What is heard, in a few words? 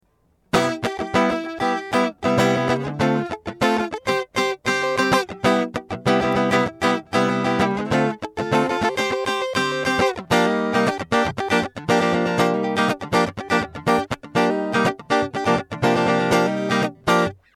Neck Chords, guitar pickups; Ironstone Platinum